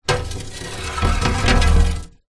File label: foley large glass loose panel scrape movement on glass